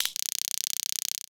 Tactics Taser

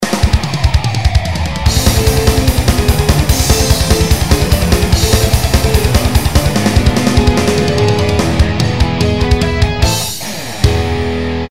Power Up

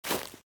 Footsteps, Human, Grass, Run; Backrooms 2D

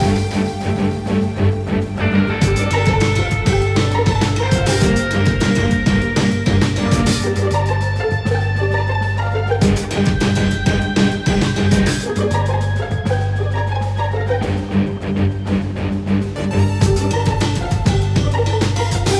loop 200bpm

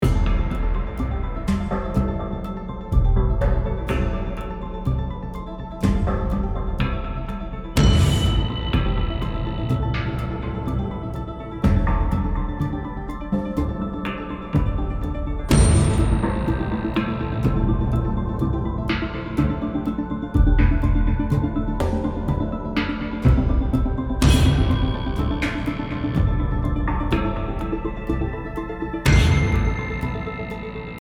Action Loop

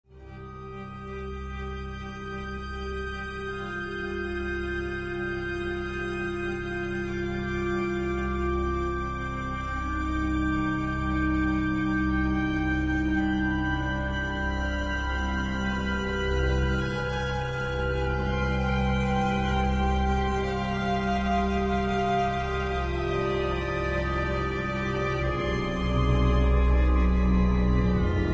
Cautious

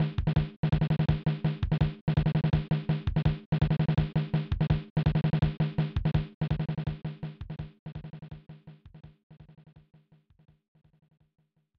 Drumbeat; fun n games 1994 snes

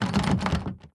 Door Lock

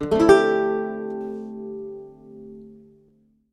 Lute Performance; The Witcher 3 Wild Hunt; Lute Anim Performance

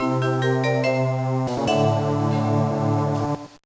Jingle; Space Hexcite Maetel Legend EX; GBA

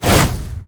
Teleport Light

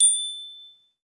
Bell Single

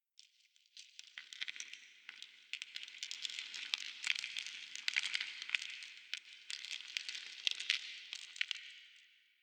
Ambience debris; adams venture origins

